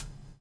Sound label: BUBL, BUBBLE; Spectrasonics - Backbeat